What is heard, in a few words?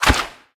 Harpoon Hit Zombie; Gameplay Wpn; Zombie Catchers